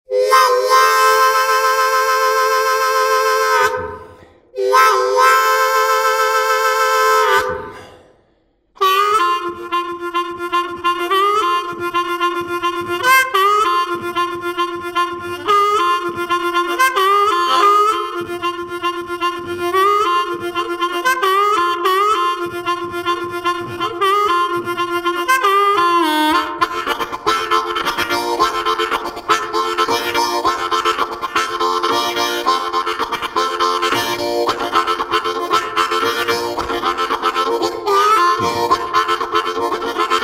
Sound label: Foot Stomping Blues